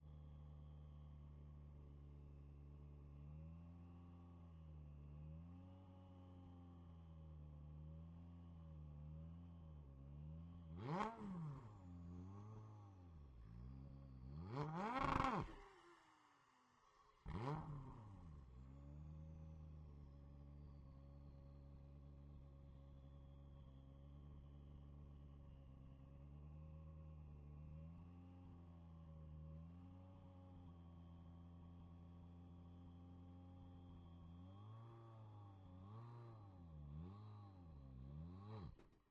Citroen DS3 WRC - ONBRD - IDLE - Steady Rev Limiter Stall Start Steady Off - EXHAUST; Pole Position; Citroen DS3 WRC 2012; DPA4062